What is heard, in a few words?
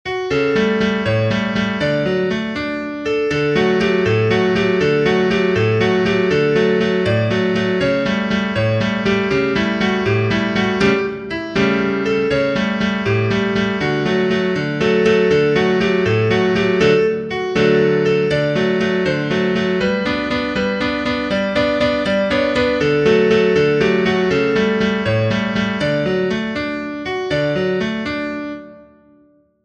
Backing Track Reading Song